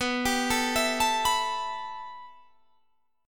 B 7th Suspended 4th Sharp 5th B down; B7sus4#5; arpeggiated

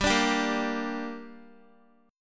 G Minor G down Guitar, chord; Gm strummed

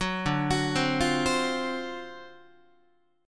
D 7th Sharp 9th, Guitar; 9,10,9,10,9,11; 4A,4E,5A,5D,5G#,6C#; arpeggiated; JGuitar

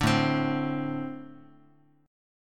A-Minor Double Flat 5th A Guitar; strummed; JGuitar

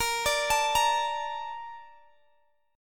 A sharp Major 7th, Guitar Open G

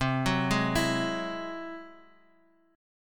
Ab Augmented 7th C, guitar

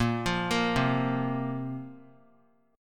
Bb Suspended 2nd Bb down; arpeggiated

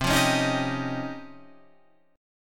C sharp Major Sharp 11th C

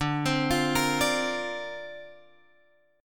D-Minor 7th Sharp 5th D Guitar Drop D, chord

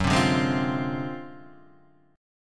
D 7th Sharp 9th Flat 5th Gb, chord

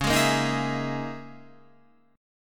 C sharp Minor 6th Add 9th C sharp

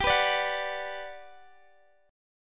G Major 7th, G, down, Guitar, Drop D

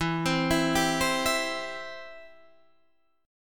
E Minor Sharp 5th E down Guitar Half Step Down

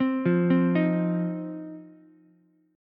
C Minor Double Flat 5th C down Ukulele; Cmbb5 arpeggiated; JGuitar

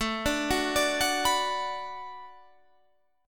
B-Minor

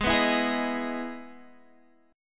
E Major 7th Ab, chord

